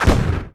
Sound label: sound Bomb; Freedom Fall